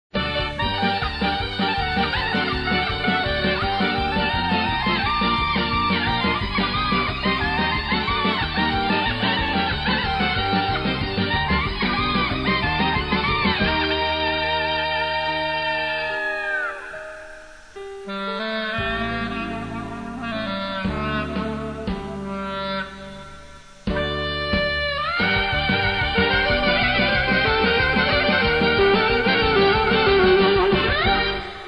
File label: klezmer